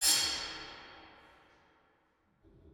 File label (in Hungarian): bogre